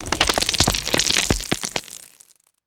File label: small sandy debris and rocks falling